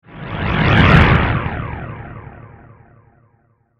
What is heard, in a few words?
Transporter, Rematerialize, Science Fiction; 5.1